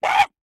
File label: Alert; Joblin